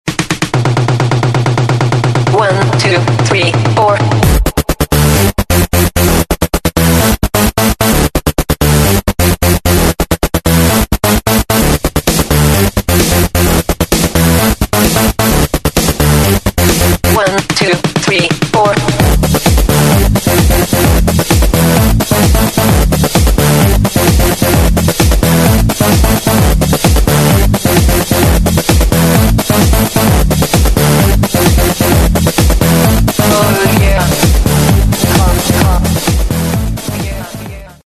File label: Drumm